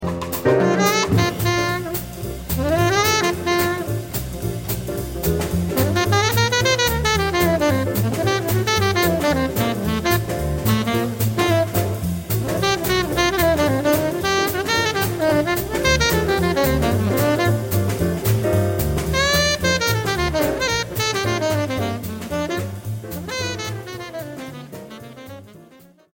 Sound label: solo